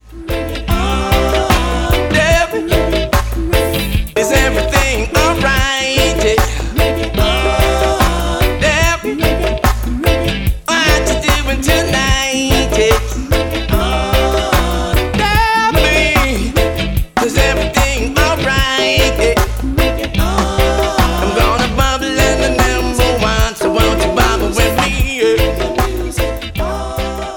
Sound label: Reggae